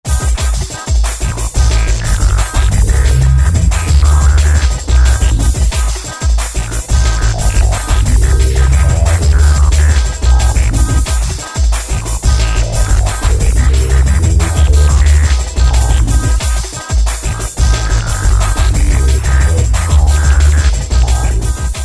Jungle Pattern, Roland MC-303

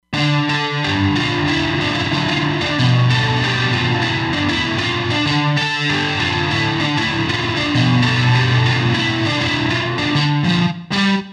Hurting Gtr; Roland Fantom XR

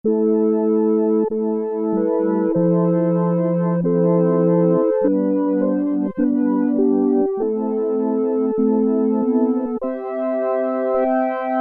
HYMN ORGAN, Oberheim OB-8; Factory Patches

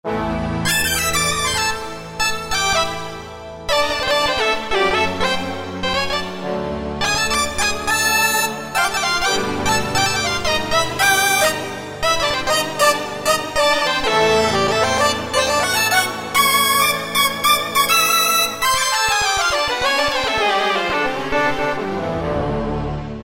Kurzweil K2000; Kurzweil K2000 V3; Factory Presets

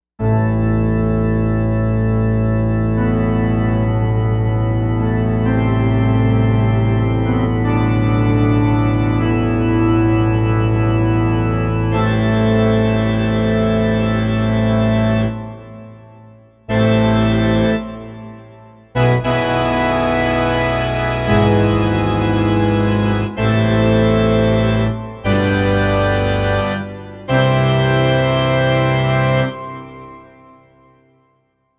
Pipeorgan, Korg M1; Factory Patches

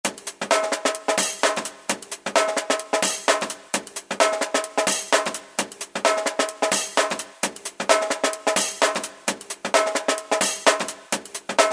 AC. LOOP 130; Best Service Alpha Dance II

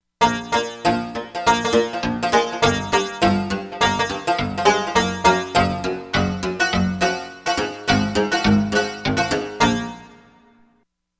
Rich Biwa; Korg MSC-13S Ethnic 2

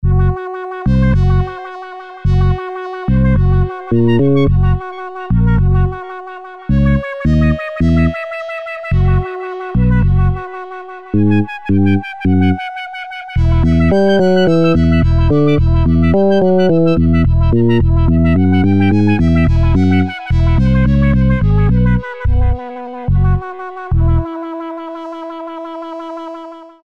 Enhanced FM Bass; Green Oak Crystal

